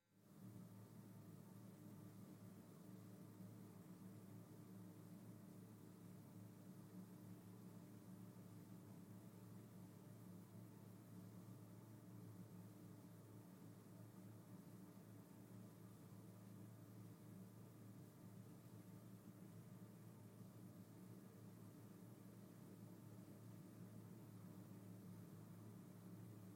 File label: ambient